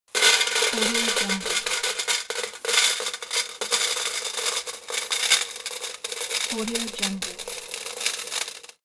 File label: coins poured in can slow